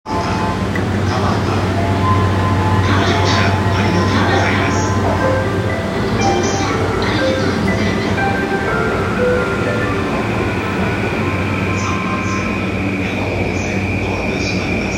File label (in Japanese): JR 田端 CCW, train sounds